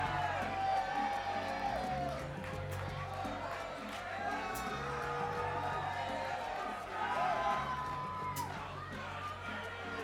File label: Crowd; Metro Chicago IL USA